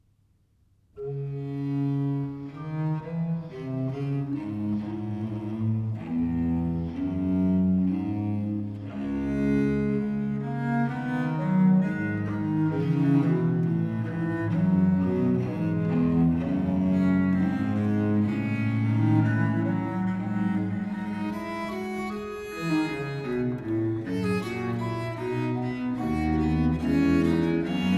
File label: duo for two bass viols, in d major minor; early music